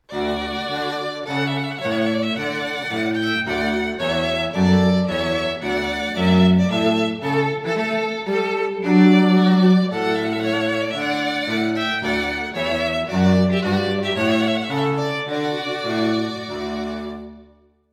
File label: Trumpet Voluntary; J. Clarke; Charlotte Strings for Events